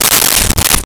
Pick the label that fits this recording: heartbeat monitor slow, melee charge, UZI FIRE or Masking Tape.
Masking Tape